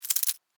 Cockroach Scurry, Animal, Cockroach, Scurry, Short, Movement, Insect, Bug